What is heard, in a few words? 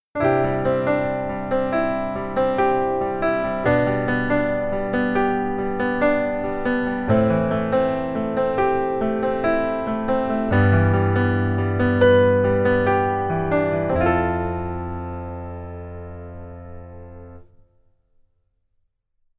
Keyboard Arp